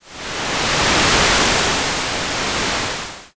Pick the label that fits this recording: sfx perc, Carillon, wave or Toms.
wave